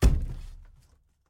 reel Stop; lost relics

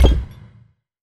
win refill; wild worlds

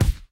counter impact; serengetikings

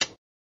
place new bet, baccarat; baccarat2

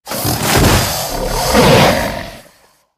win Crocodile; jungle spirit

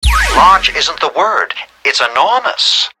Big Win Epic Win; knight rider

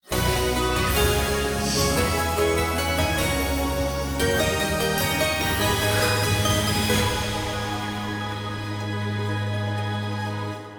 freespin countup; turn your fortune

